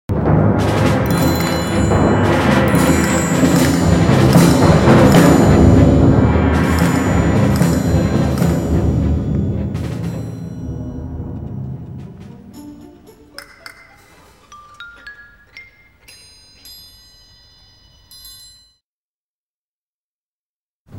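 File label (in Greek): Κρουστά συμφωνικής ορχήστρας Η οικογένεια των κρουστών; H oikogeneia ton kroyston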